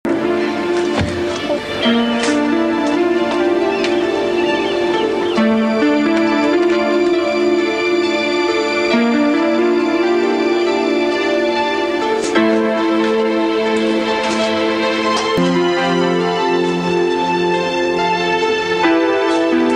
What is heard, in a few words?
dramatic theme